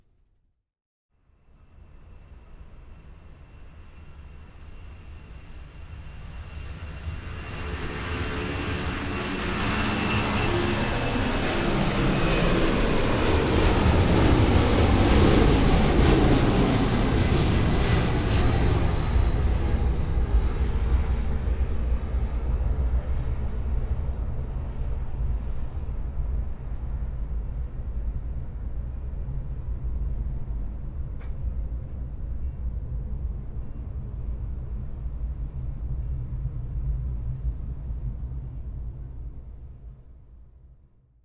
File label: Boeing B747; Пролёт Боинга 747